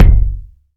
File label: huge steel kick drum c key